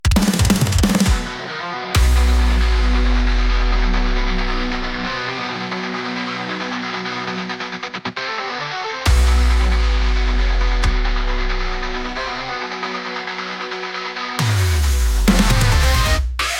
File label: brostep banger bass